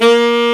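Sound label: tenor sax, FAIRLIGHT CMI IIX REEDS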